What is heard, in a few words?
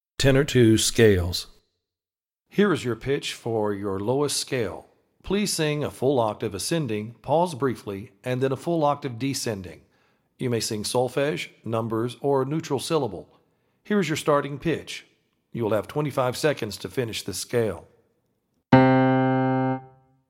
tenor 2 scale audition